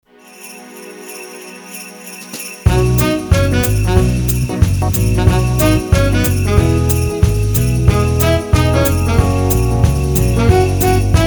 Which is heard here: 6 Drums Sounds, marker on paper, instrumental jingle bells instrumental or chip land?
instrumental jingle bells instrumental